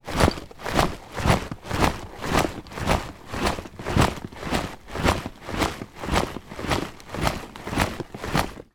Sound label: foley bag back pack soldier movement marching; zapsplat